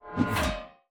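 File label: infantry mace, weapon; Battle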